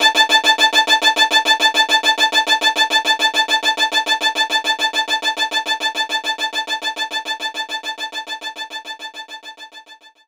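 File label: Violin Strike A3; knights try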